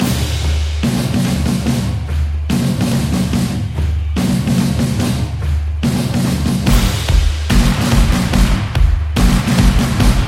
Football Stadium, football drums

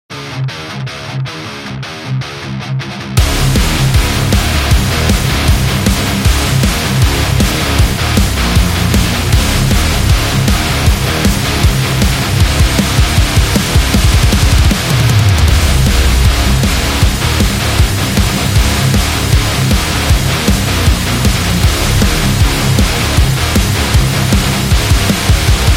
Monster

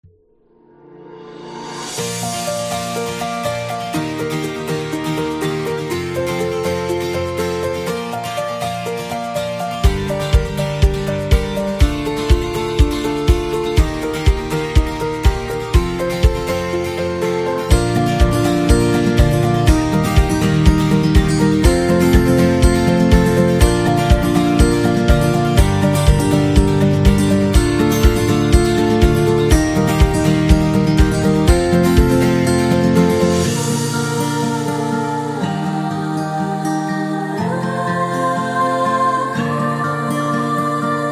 Acoustic Upbeat; birthday music

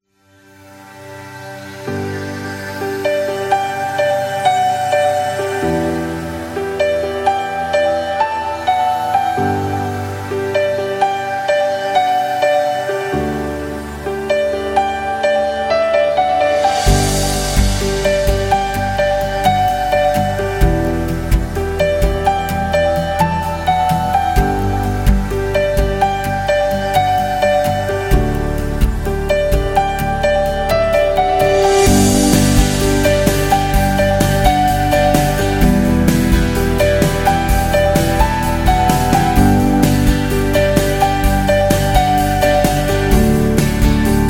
Inspiring Corporate Music; corporate music vol 16

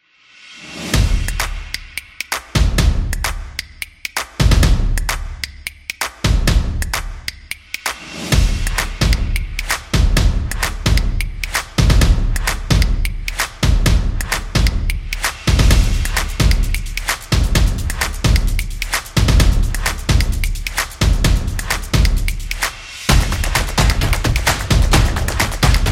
Sport Stomp

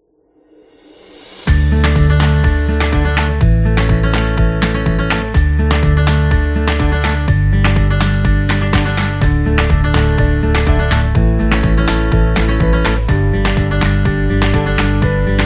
Epic Upbeat Powerful; corporate music vol 8

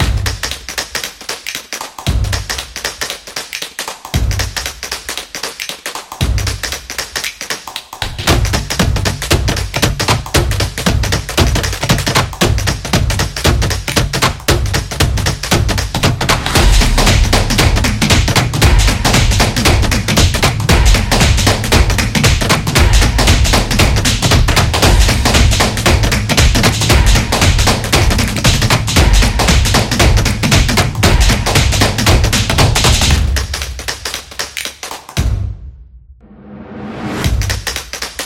Action Beat

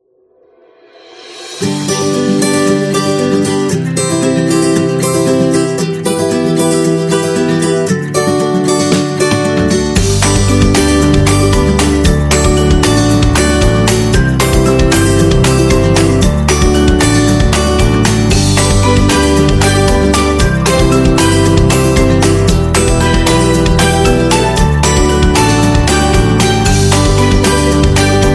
Upbeat Fun; cooking show

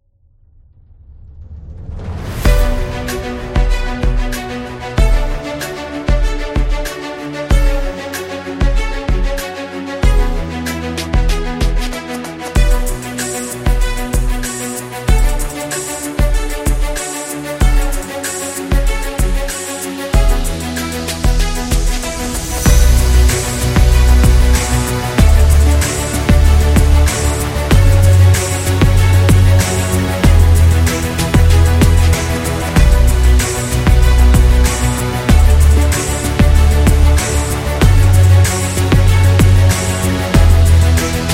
Hip Hop Trailer

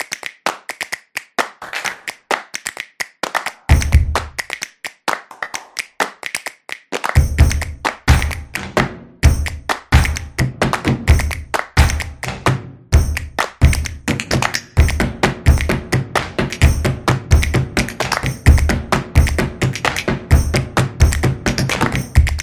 Percussion Claps; percussive nation vol 1

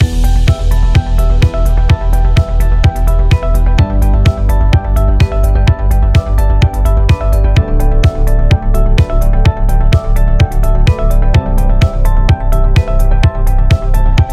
Technology Loop Digital

